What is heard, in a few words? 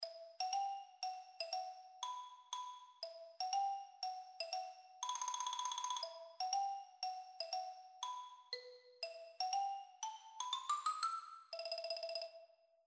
keyboard